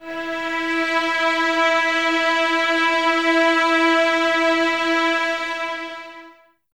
Violins E 4, String Ensembles; Light; Miroslav Vitous; 11 Violins

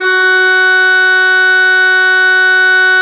F4, Cathedral Organ; ILIO; Propeller Islands

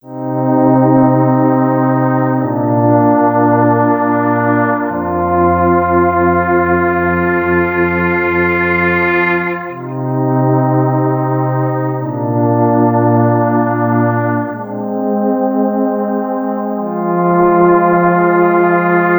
Prophetic Pads, Electro Lounge; Sonic Foundry (Sony Creative Software); 100 Divide This

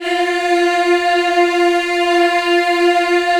F3 women eh, Choir Acoustic & Synth; USB Soundscan vol.28; AKAI

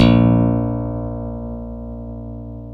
STICK A1, Chapman Stick; Analog Odyssey

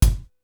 KICK, Hip Hop

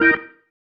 Stab One Shots, Organ

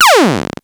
Zap, Yamaha Motif ES; electronic & zap; Yamaha Motif ES-Series

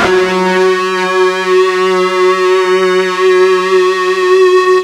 FUZZ F#2 L, Guitar; East West; Steve Stevens Guitar Samples Collection

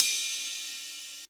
HH, Dance Mega Drums; Best Service; HH-HIP

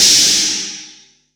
crash R; Zero-G; Chemical Beats; CRASH ZOO

